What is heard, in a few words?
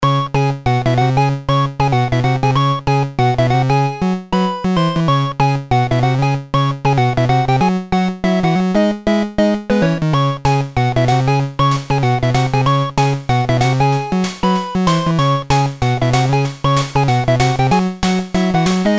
SK8 Beat